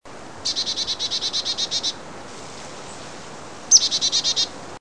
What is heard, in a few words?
chickadee